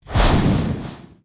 Teleport In; Grimm

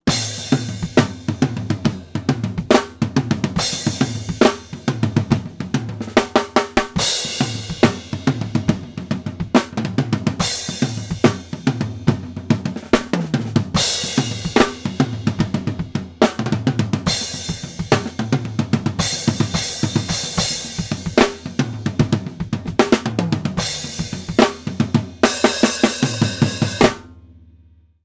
drum play music; gamerip; The Sims 2

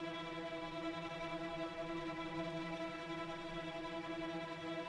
Suspence

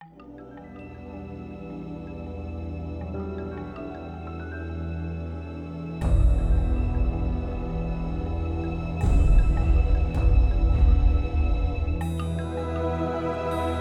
town music

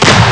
Rifle

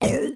Enemy Bumbkin Run

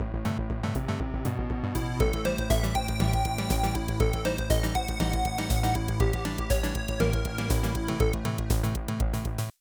Continue - Ten Count Continues; Chippoke Ralph no Daibouken

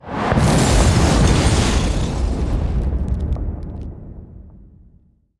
Bullet Shower; starbites

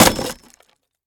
Impact Axe Wood Hit Scrape Metal; Frogsong

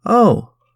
Chorus surprise

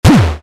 shot cotton touch; starbites

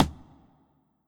Strike Imp; Gnoll

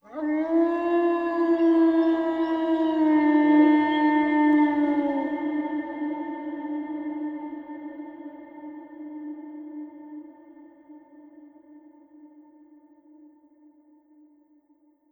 Animals; Intro Animals3

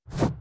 Moss Knight Jump

